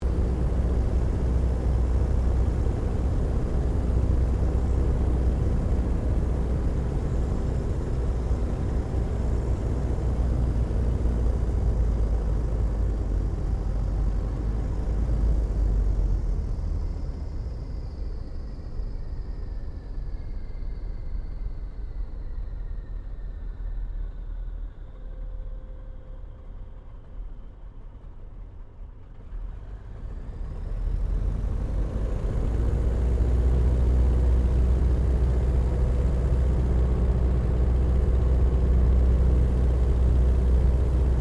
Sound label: AIRCRAFT 1916 SOPWITH PUP REPLICA FLYING INTERIOR STEREO; Airplanes; Sound Effects:Vehicles